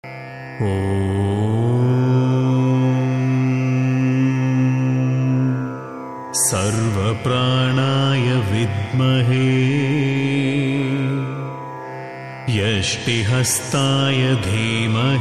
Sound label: vayu gayatri